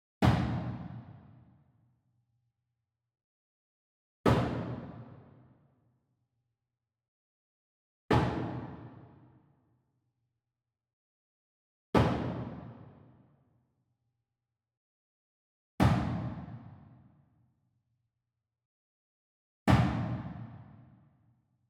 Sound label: Wood Box Hammer Hit Far; SWEETENER; boom library; Cinematic Strikes Construction Kit